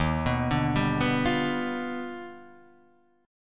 Eb Augmented Major 9th Eb down Guitar Half Step Down; Eb+M9 arpeggiated; JGuitar